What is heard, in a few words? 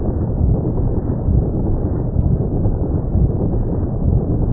crusher